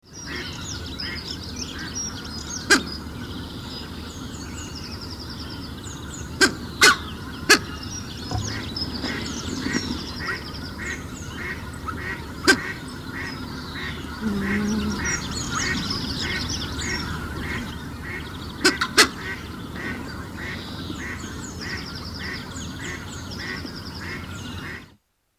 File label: ashy-headed goose